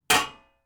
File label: pot clank put down metal lid on pot handle